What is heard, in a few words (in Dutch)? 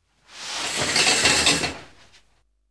Douchegordijn Open; KMP Film Video Sound Effects